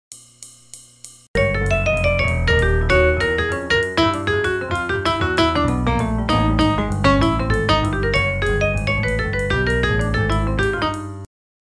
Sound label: 8 bars; tp aratori vol 1